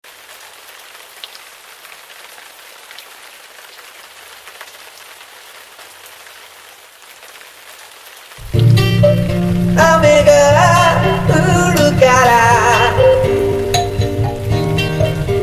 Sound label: mbira guitar